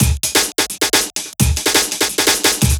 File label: Fill, Loops, breaks; M8